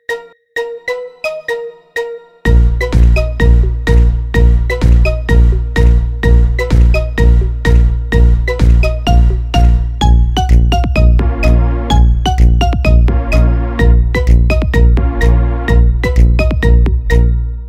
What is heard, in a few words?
Marimba Remix